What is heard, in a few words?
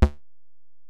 Bell, Alesis DM-PRO